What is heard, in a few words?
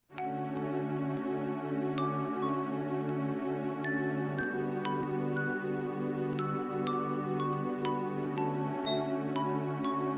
Gentle Glockenspiel in D Maj